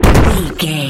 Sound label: rock impact spike trap